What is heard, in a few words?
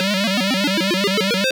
player 1 up; defender; arcade-canvas